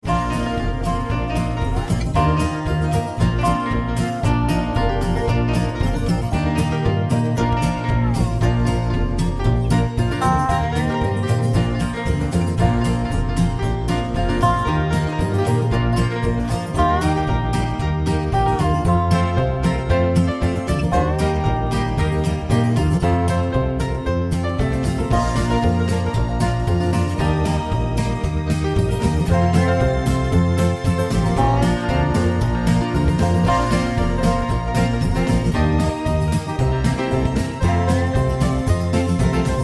High Key

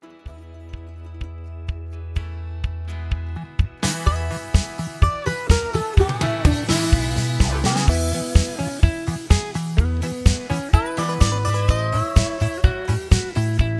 Instrumental

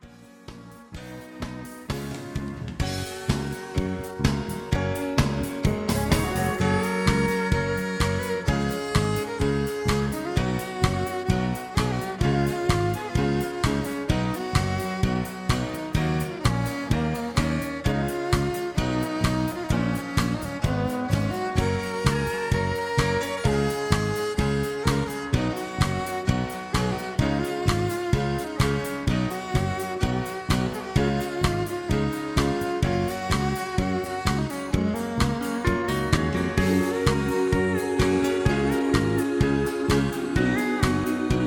Low Key

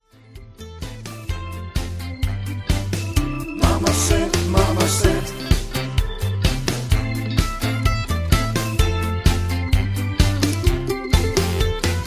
High Key

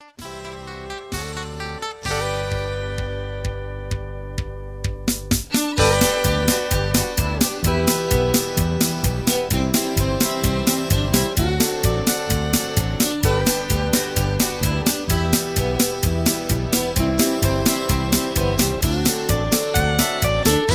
Patter

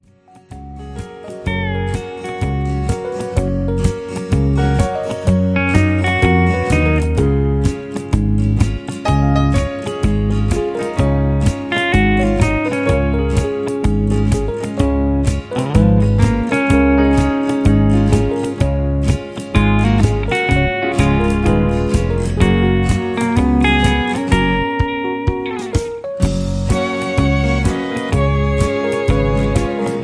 Instrumental with BGVs

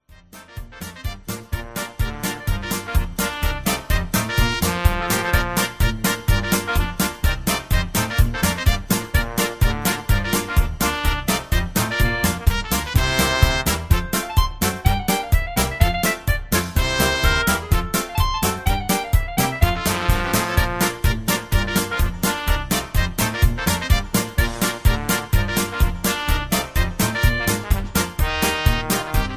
Rag Patter

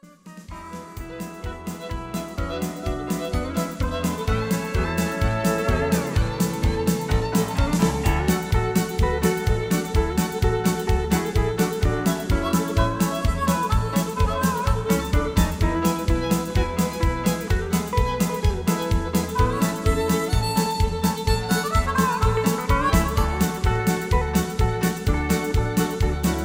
Honky Tonk Walking Instrumental

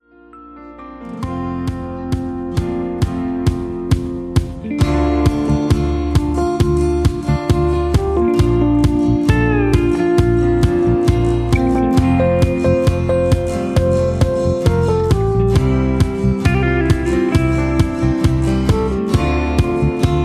Instrumental with Leads

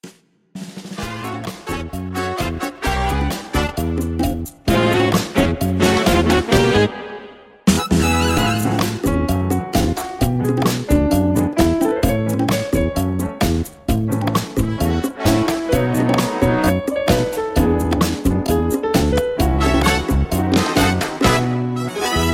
Instrumental Key of E

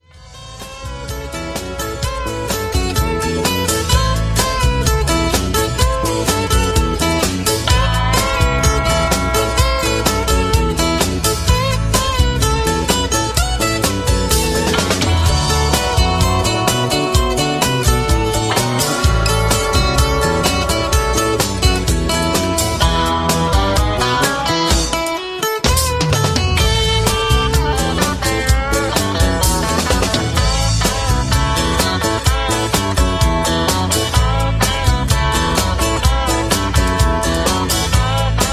High Key

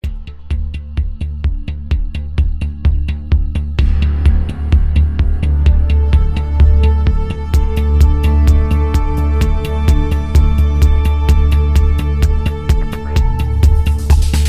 Patter

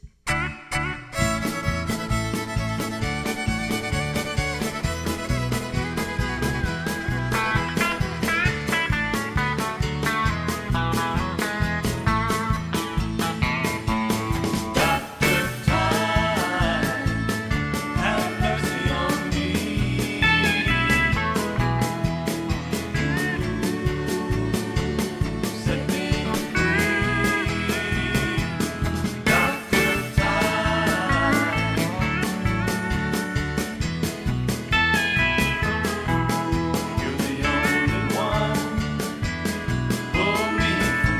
Instrumental

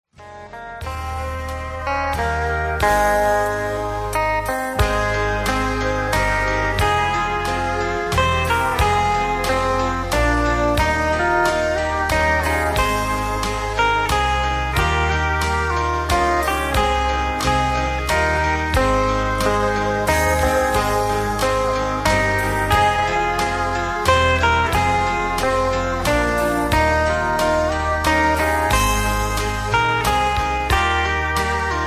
music with SOFT LEAD in LOWER KEY

